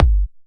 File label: Sub Perc, Bassdrums; Vengeance Samples